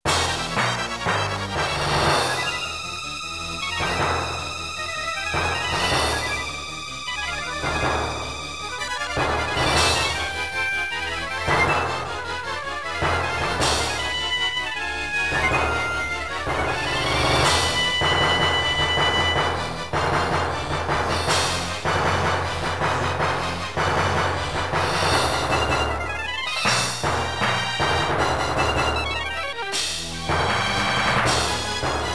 Battle Theme Cave, Dungeon; Dungeons & Dragons Collection; Saturn

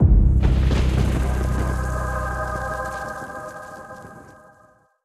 Curse Acquired; Going Under

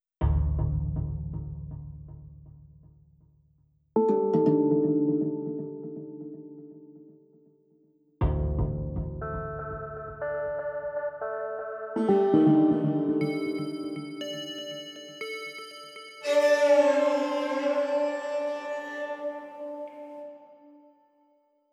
Synths 120

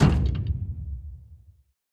Bounce Metal Heavy; Going Under

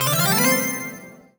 collect item jingle; Strike Force Heroes; Xbox Series X/S

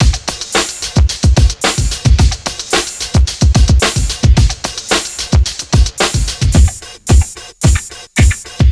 Ditty; NBA Inside Drive 2003; Xbox

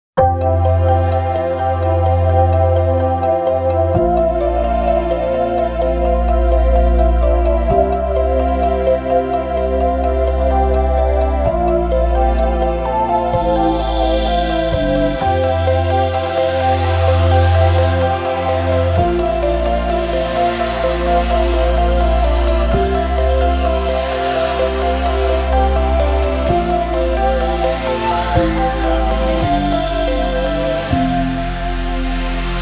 Ending; Ending 1 (Ending 5-B, 5-D)

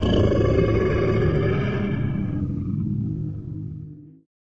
growl; Lethal Company

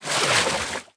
Hoverboard Loop Water Splash Out; Subway Surfers City; Android, iOS

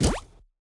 Trials Carousel Page; subway surfers city sound effects; Android, iOS

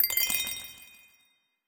Goal

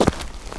Step; Laundry; Neighbours From Hell Console Edition; GC, Xbox

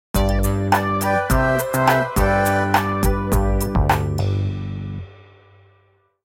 Level Won; Aporkalypse Pigs of Doom

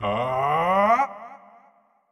WindUp Long; Marv; Going Under

